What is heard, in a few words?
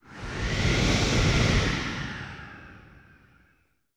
Wind Gust Foley Sweetener; Sony Pictures Sound Effects Series Volumes 1-10; Sony Creative Software